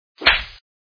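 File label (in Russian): удар по щеке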